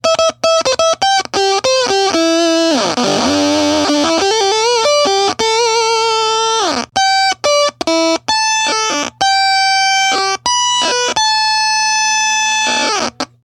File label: 8bit Fuzz